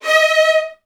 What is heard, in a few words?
D#2, Strings, VN 16TH; ILIO; Kirk Hunter